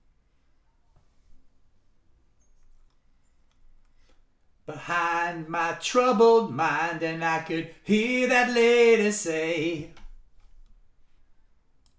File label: vocals